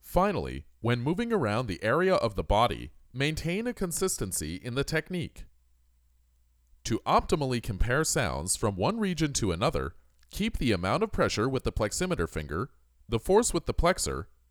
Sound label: Percussion